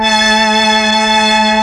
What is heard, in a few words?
STRING; ILIO; Propeller Island Legendary M400